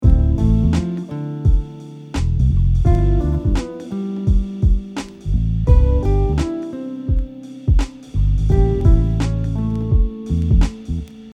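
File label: Chord tone arpeggios descending, guitar; Chord Tone Arpeggios - descending pattern 1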